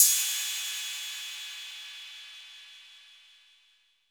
CYM 808 CR, Drum Machine; Roland L-CDX-01; Cheese

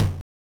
Tom, Genesis

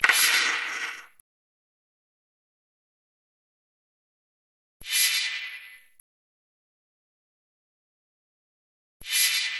Dark Funk Wand 100, percussion elements

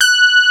SB HORN; Wizoo - Powered Nord

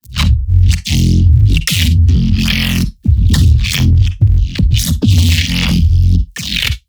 Neuro, bass

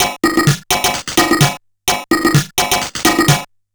Bent TR505 (128BPM), Circuit Bent; glitch

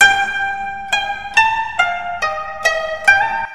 KOTO LOOP; USB Soundscan vol.60; Asian Traditions; AKAI

